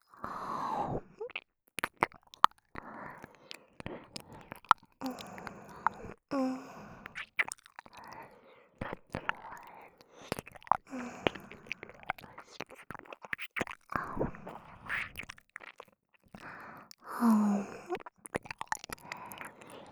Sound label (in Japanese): 耳舐め 右